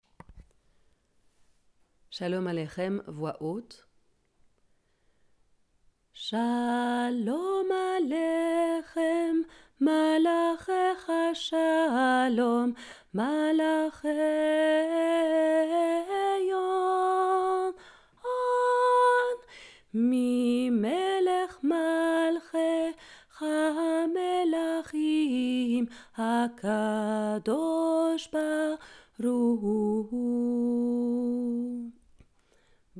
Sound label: Shalom Aleichem Bass